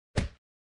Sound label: kick; puzzleweave